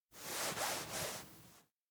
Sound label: wipe drool; anemoi